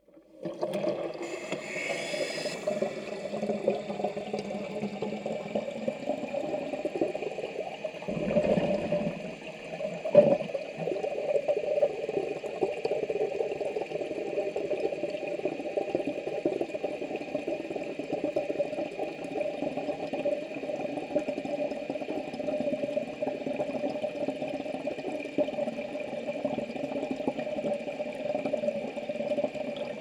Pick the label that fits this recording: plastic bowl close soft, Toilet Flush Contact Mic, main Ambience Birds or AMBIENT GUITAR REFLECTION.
Toilet Flush Contact Mic